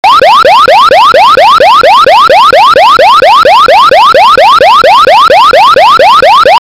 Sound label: tone